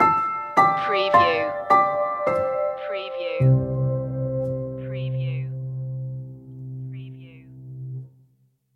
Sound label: warm and inspiring stinger